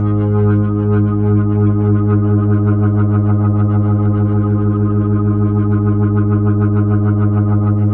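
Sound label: 1G# L, Slow Fast Hammond, Organs; E-MU Producer Series Vol. 5 – 3-D Audio Collection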